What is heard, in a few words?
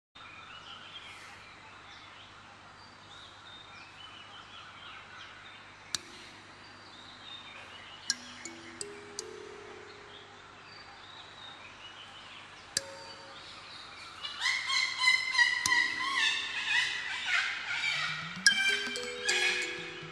Laughing Drums